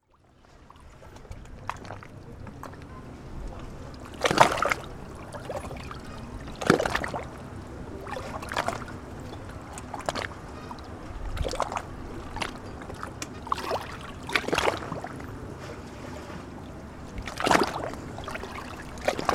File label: Waves At The Edge Of Bosphorus